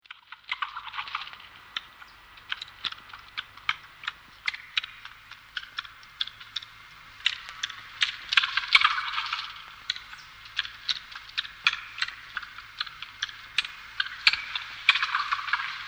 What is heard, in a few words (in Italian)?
scoiattolo, Mammiferi; Monte Pisano; Animali; I Tesori del Monte Pisano - I Volume Gli Animali